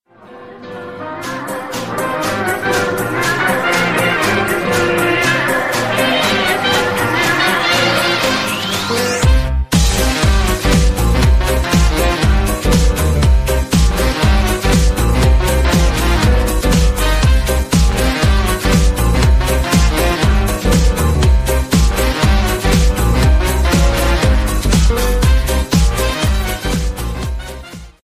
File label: Electro Swing